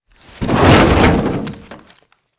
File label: foley car slide hood